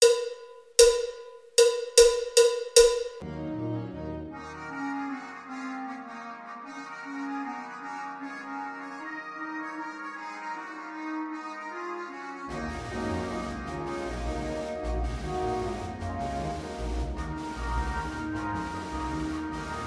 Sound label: Spanish Dance